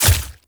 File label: Sword Impact Flesh; Weapon Impacts; Player; Swords; Short Sword; Combat; Local Player